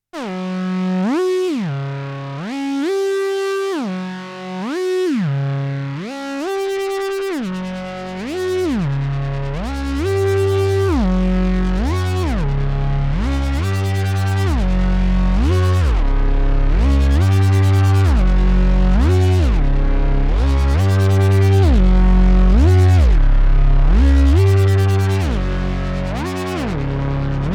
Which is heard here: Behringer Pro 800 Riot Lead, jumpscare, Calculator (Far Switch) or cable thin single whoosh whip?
Behringer Pro 800 Riot Lead